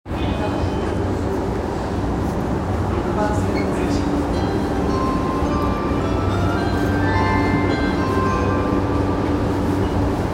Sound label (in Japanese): 南北線飯田橋南, train sounds